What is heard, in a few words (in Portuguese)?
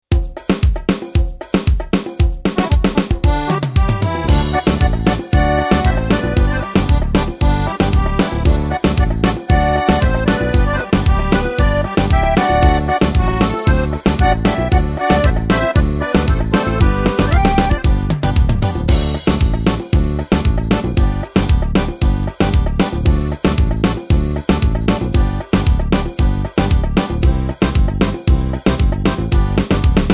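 ritmos yamaha sertanejo dançante universitario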